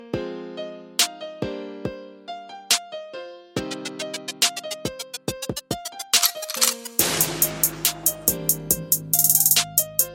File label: Cool Hip Hop